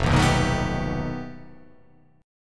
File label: F 9th Flat 5th F down